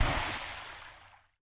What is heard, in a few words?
Splash